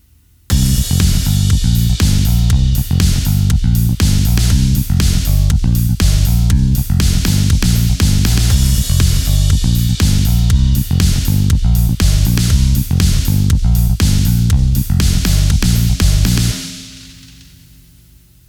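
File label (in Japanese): グルーヴ B, shuffle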